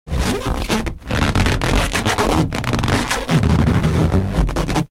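drawn-out distinct prolonged squeak of a rubber balloon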